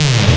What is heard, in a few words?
Flanga, Growl Tables; Cymatics; Growl Tables Vol 2; Serum